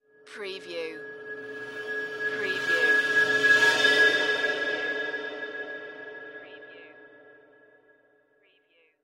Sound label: Swell Metal